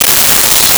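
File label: Reverse Cymbal; Nintendo; July 2020 Gigaleak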